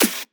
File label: Claps & Snares; Mutekki Media; Vengeance Electroshock Vol.2